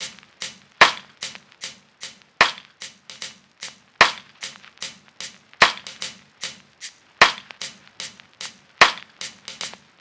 tops 75, Drum Sample Pack Loops Top Loops; SD SEISMIC; Slate

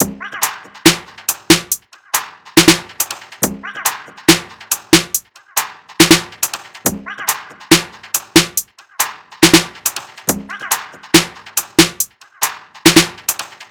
tops 140, Drum Sample Pack Loops Top Loops; SD SEISMIC; Slate

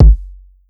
Kick, 909 Kicks

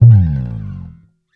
Bass one shot Dive FX Bright, Electric Bass; Slate Digital BASSHOLE Sample Pack

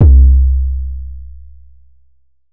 808 one shot C; Slate Digital BASSHOLE Sample Pack

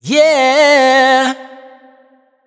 riff Yeah Flips Cm wet, Vocal; Slate Digital Omnivox Vocal Sample Pack

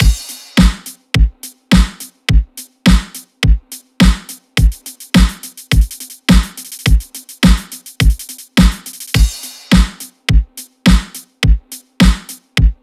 drums 105, Drum Loops, Full Drums; M3; Slate; MIDNIGHT Sample Pack

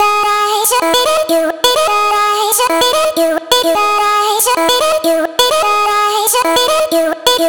Omnivox Vocal chop Mellow 128 E; Chops; Slate Digital Omnivox Vocal Sample Pack

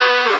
vocal charge Bm; Slate; AURORA Sample Pack; Vocals

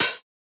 hat, drum sample; Drum Sample Pack; One Shots; Hats; quik; Slate; SEISMIC

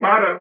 vocal mighty Am; SD AURORA Sample Pack; Slate

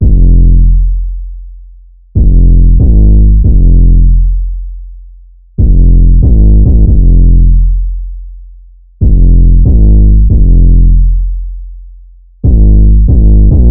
808 loop 140 Fm; Slate Digital BASSHOLE Sample Pack; 808 Loops

